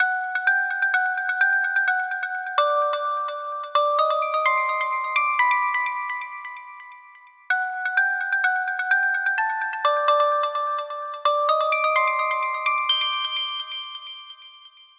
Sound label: Melody; Super Crush K.O.